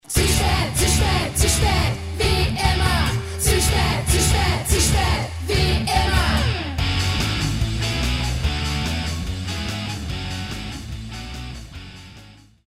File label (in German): Zu spät Jingle